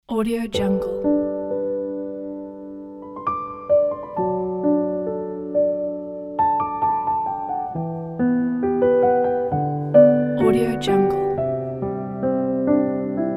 wedding piano; elevenbstudios

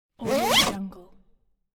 zipper bag open

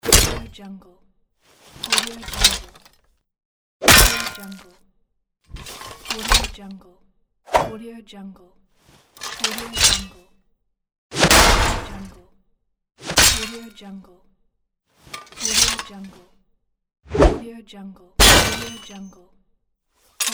rpg medieval weapons pack; abloom audio

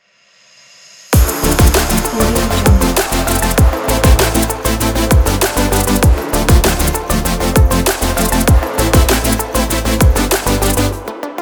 future bass kit

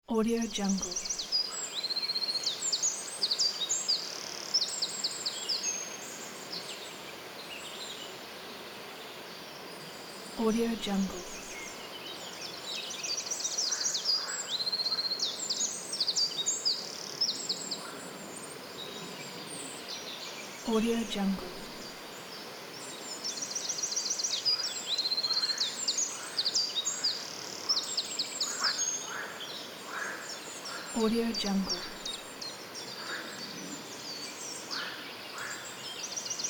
pond atmosphere with frogs and birds

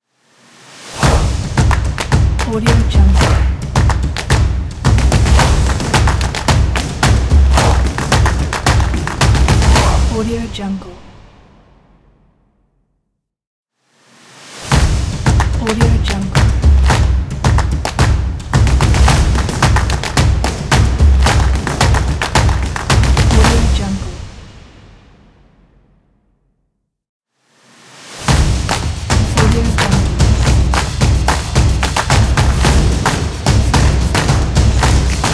claps drums logo pack; petruality

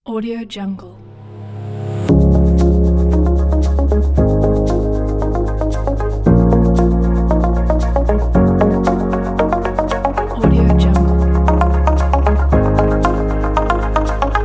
modern corporate motivational

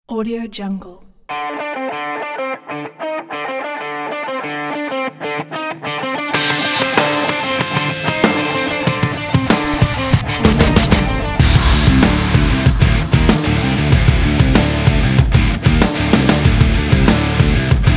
grunge sport extrime

